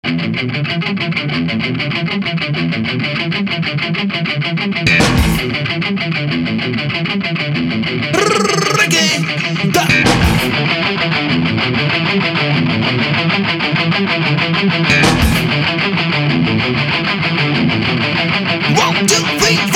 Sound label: Reggae